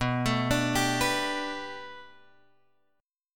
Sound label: B Minor 7th Sharp 5th B Guitar Drop D, chord